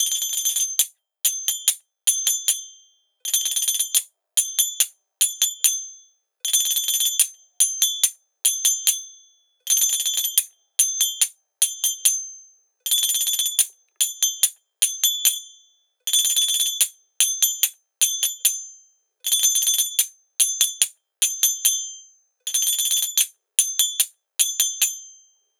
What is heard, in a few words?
Tull Loop BPM 75, Assamese folk; Gully Loops; waves of bihu